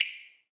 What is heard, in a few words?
menu direct hover; saiyku [rby] instafade